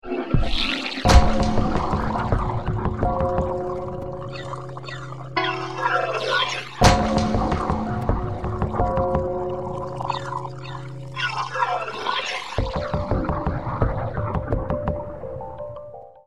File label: teacup; using sounds produced from one teacup. The samples are manipulated with a 12-bit Roland W-30; STORM IN A TEACUP